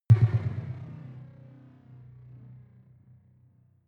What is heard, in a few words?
sci-fi vehicle engine stall; raumschiff